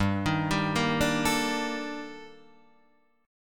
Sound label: D sharp Major Sharp 11th, G